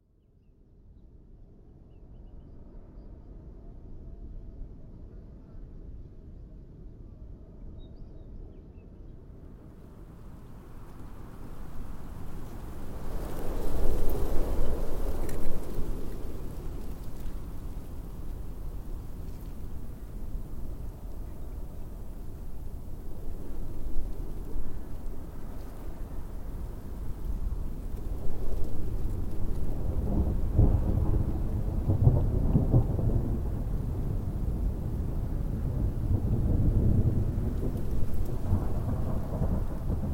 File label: Deserts Weather Wildlife; STEREO; BOOM Library